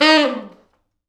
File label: T Sax falls, Horns Sax